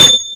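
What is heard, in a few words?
sledgehammer; DRUMS FX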